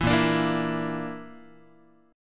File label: Bb Major Flat 5th, Guitar; strummed; JGuitar